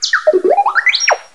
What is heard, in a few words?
talk; Star Wars; R2-D2